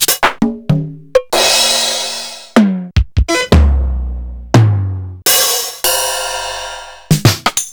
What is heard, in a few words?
Linn LM-1